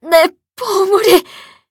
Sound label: hit; Cookie Run